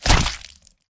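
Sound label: Stalker Movement Synced Melee Chestpunch Extract; Titanfall 2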